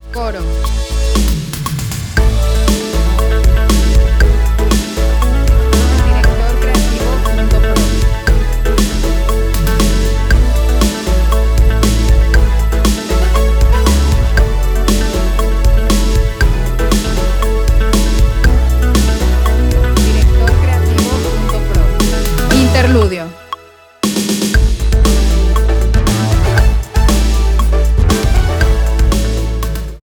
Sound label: 118Bpm 4/4 A